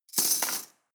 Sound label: cutlery teaspoons drawer place